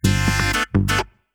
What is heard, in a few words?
Sport jingle; onisep